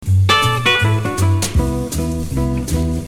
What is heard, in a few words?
Bluesy Note Duets